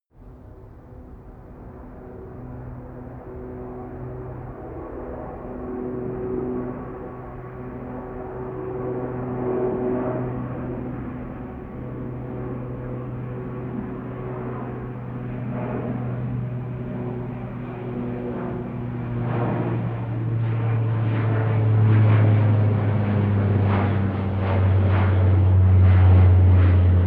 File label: propeller plane flyby land